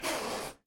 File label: Cat Hiss